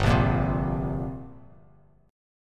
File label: G Minor Double Flat 5th, G, Bass Standard; strummed; JGuitar